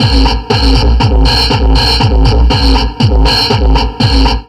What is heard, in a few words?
Drum Loops Crazy Processed